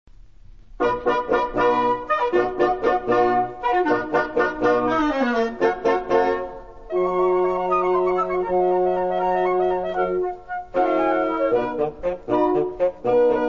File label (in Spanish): Himno de la victoria